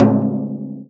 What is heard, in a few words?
FRAME, INDIAN PERC